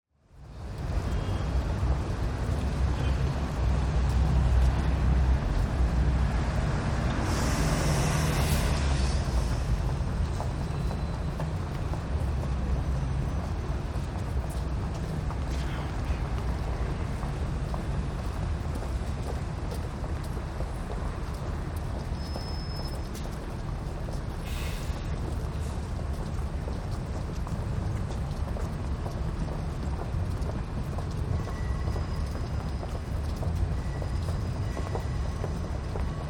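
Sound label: ambience, London Bridge Rush Hour; noise creations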